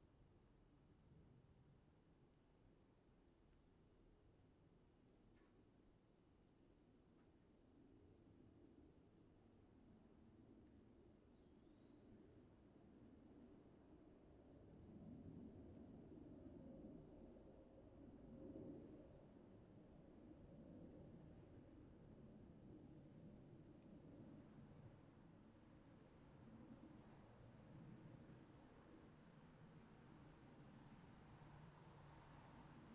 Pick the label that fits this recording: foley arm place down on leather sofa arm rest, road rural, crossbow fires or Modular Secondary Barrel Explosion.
road rural